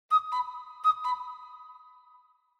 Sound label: Cuckoo Clock Reverb Mid